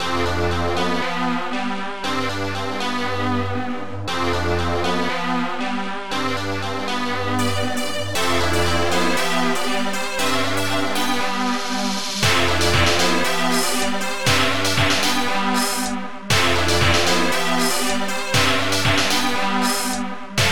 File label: guit; SoundMOD Volume 1